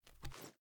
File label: reload empty fast swing, sh; gmod; wfoly plr sh charlie725